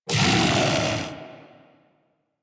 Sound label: Kubrow Armored Vocal Alerted, Enemies; Warframe